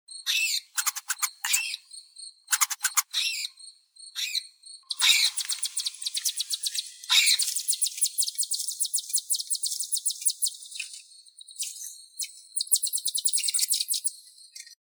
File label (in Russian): zvuki prirodi sonya sadovaya eliomys quercinus